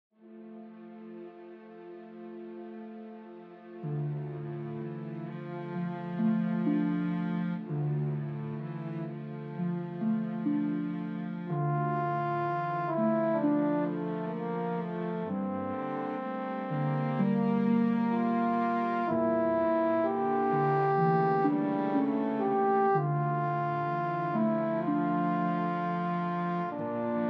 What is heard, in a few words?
Sad Ballad Scene w o Dialogue